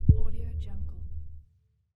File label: underwater resonant metallic impact